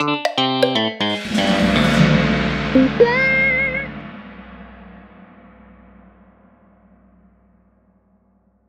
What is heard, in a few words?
Level Failed; chicken range; ps vita ps4 switch; Mouse